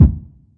kick, classic hip hop

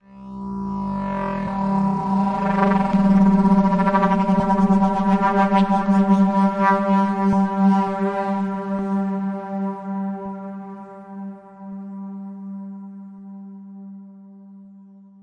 approaching spindown synth; SYNTH KEYS ETCETERA

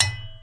found sound forkoophone; UNUSUAL KITS and FX; Werkstatt Found Sound Kit

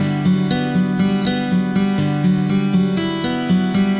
acoustic guitar picking

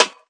rim, Classic Hip Hop; STYLE KITS; Classic Hip Hop Mega Kit

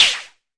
Arturia MiniBrute sn

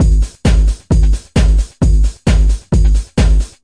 stompy house, drum loops and breaks

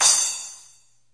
crash

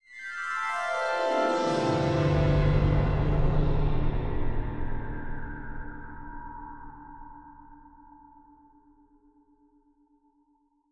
chimeverb uplifting into dark; Atmospherics

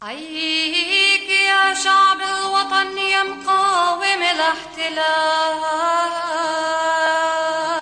hindi woman, FEMALE SINGING; VOCALS and SPOKEN WORD

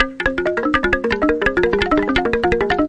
165 busy jungle percussi; 161 to 180 bpm

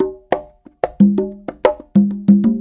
090 bongos, 090 bpm; Bazillions of Bongos

